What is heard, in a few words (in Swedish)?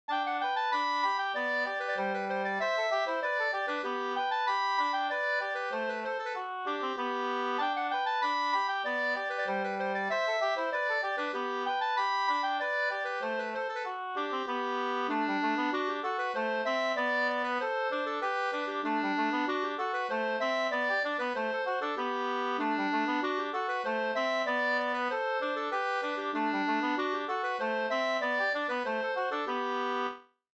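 slangpolska, two-part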